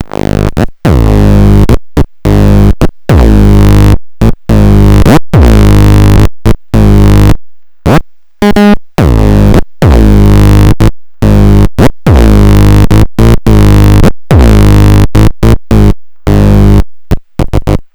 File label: Ext. Instrument; juno6